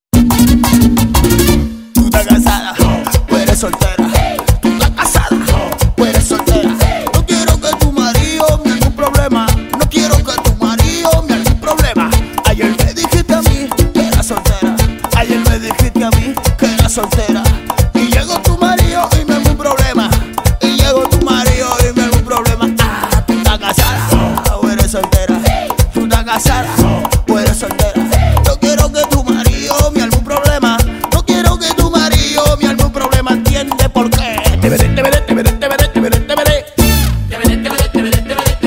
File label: Salsa; Kariské tance